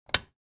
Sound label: Household Light Switch Click On